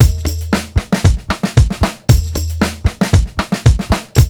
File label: PICK BEAT, Roots Disco